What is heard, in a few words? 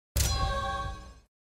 crystal gem obtained; crash